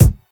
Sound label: high quality sharp kick sample c sharp key, kick drum